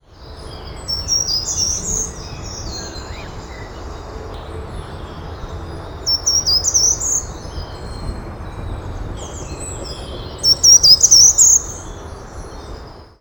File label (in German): gartenbaumlaeufer